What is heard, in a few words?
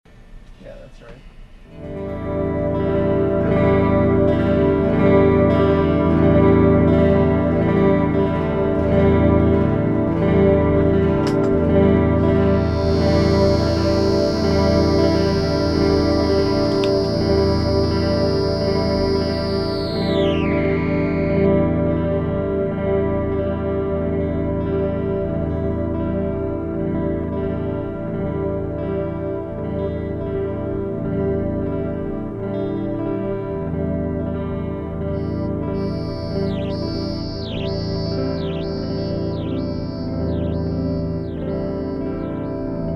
Electric Bird Noise; Remora; WCSB